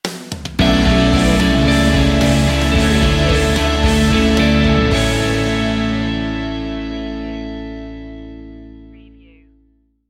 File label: only time stinger